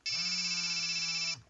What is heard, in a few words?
nokia 5510 ringtones low